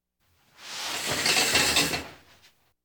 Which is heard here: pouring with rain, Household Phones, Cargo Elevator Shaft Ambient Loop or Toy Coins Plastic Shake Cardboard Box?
Household Phones